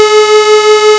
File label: Electric Keyboard